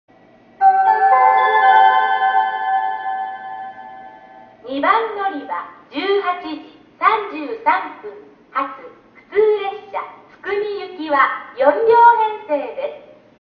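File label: fireworks; 大分駅 案内放送（津久見行き）; tukumi hanabi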